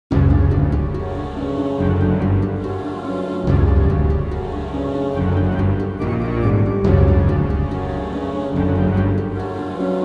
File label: Lose Game; heroes of might and magic ii gold millennium edition 1999